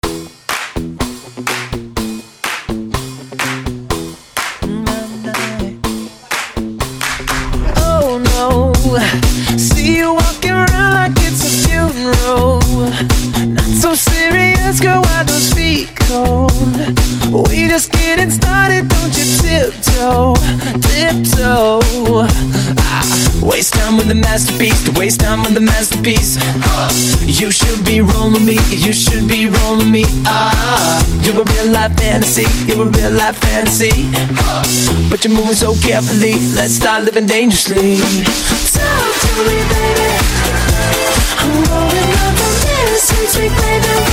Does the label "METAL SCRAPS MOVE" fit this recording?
No